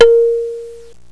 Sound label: gong